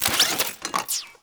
Drone Switch In; Warframe; Deimos; Cephalon Drone

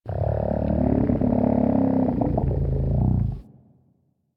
Landscape Mushroom Creak, Ambience; Warframe; Venus Open World; One Offs

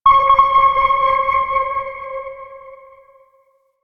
Radar Ability Cast; Warframe; Quests; The New War; Drifter; Abilities

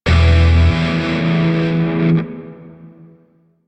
Shawzin Maj Chord F; Grineer; DE; Warframe

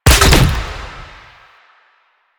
Grenade Shot; Warframe; Lasrian; Lasrian Soldiers; Weapons; Lasrian Chem Nox

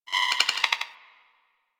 Beep Stutter; Warframe; Ambience; Corpus

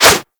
Whoosh, Weapons; Warframe; Furax

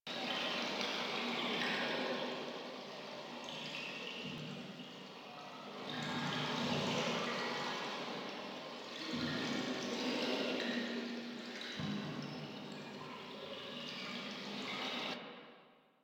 Lair Liquid Flowing Loop; Warframe; Ambience; Sentient

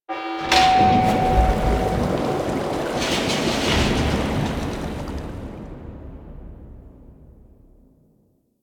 Forest Water Drain; Warframe; Ambience; Grineer Forest; Grn Forest Spy Vault D

